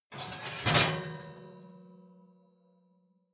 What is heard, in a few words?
oven baking sheet draw out